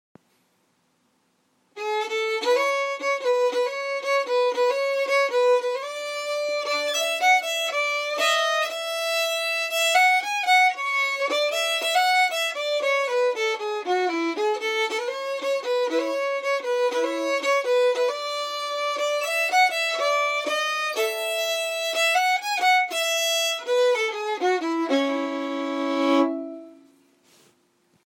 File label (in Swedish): stämma variant; Snöstormen